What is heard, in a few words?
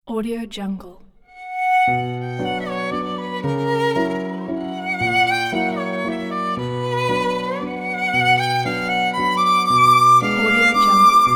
waltz in b minor; frederic chopin; eddiehoncha